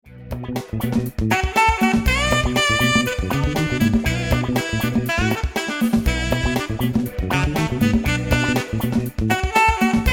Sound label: Funk Alto; Gotsu Sepia 6